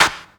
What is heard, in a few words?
CLAP, Dance Drums; Best Service ProSamples vol.15; AKAI